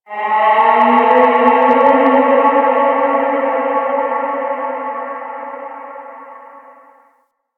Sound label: Landscape Ambient Texture; Warframe; Ambience; Venus Open World; Textures